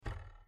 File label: Antenna Oscillating